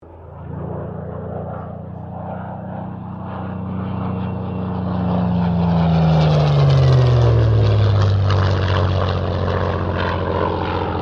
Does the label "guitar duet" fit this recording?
No